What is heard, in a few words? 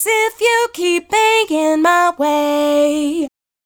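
128 Gm BV Hi Double Female Vocal Dry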